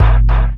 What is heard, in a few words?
Metals Kick; Sonic Foundry (Sony Creative Software); Esoterik Beatz; Metals Kit